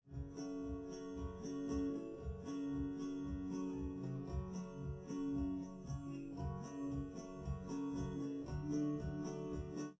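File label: with drums, live rec; SiSEC11; underdetermined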